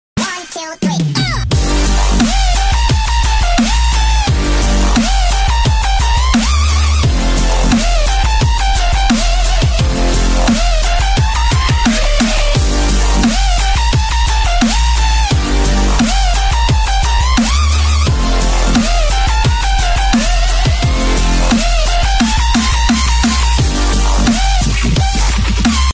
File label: Dubstep, ringtones